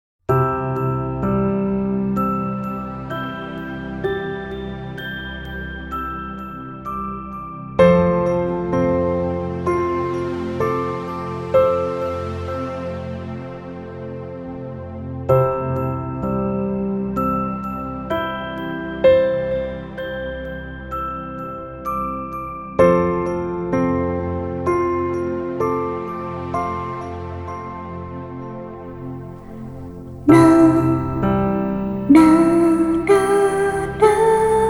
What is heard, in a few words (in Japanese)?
子守唄 アレンジバージョン